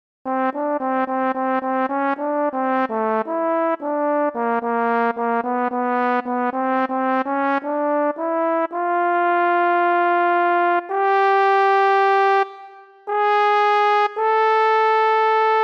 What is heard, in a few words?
Lead, Barbershop; Arranger / David Harrington